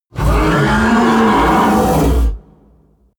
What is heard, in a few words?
coloss roar loud deep; kreaturen